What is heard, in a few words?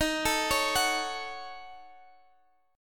Eb Minor 7th Flat 5th, down, Guitar, DADGAD